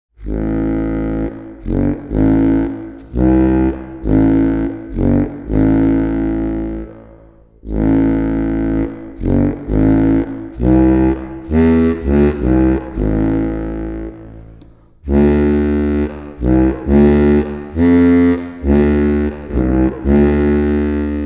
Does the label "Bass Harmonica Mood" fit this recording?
Yes